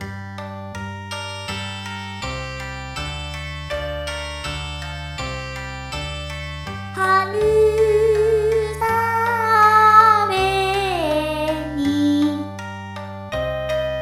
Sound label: spring rain, vocaloid; 初音ミク; ボーカロイドに童謡・唱歌を歌わせよう！; traditional